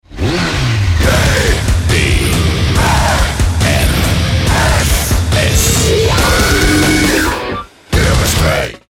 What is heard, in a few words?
heavy metal